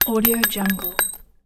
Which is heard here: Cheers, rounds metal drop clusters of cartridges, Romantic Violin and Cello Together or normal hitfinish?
rounds metal drop clusters of cartridges